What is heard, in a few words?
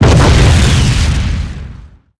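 exp large generic, explosion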